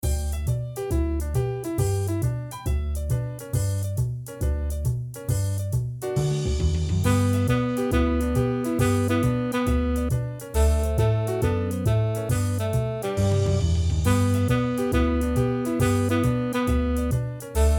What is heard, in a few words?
jazzy sax